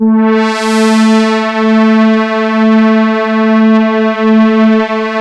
MONO PAD; PADS

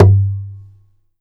BODY, guitars; Spectrasonics; Hans Zimmer Guitars VOL-2; FNGSTYLE PFM

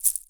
tiny pods, African set percussion; Voice Crystal - Steve Reid Definitive Percussion

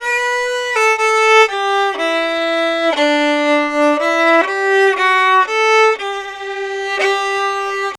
Fiddle, Appalachian; Continental Drift Aftershocks

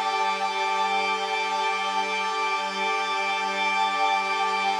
Pad Loop G, shimmer and sparkle

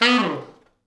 T Sax falls, Horns Sax

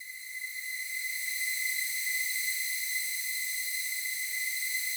slow strings C6, Yamaha VP-1; 90 s Sample CDs; Music Store

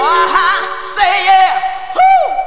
aha huuh; Urban Shakedown

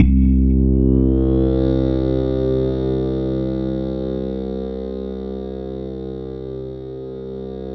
PHA, synthesizer bass; Synthesizers; Soli-Music; World of Synthesizers VOL-1

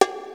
DRUMS; Rubber Chicken Software Sample Bank

